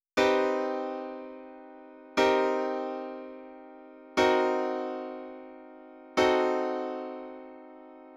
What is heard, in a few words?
CmM7 Cm7 Cm7-5 Cdim7